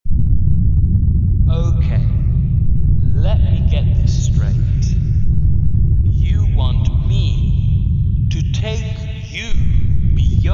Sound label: jingle; Outer Rim CBS